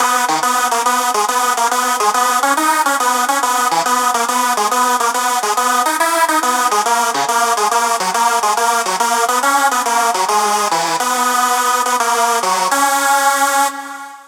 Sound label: Hardstyle Hardcore